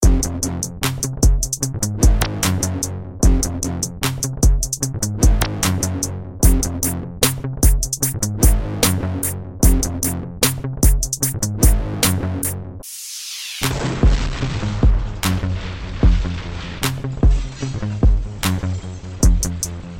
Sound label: Ev Beats Yea Ok